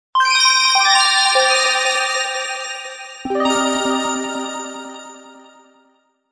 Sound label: bell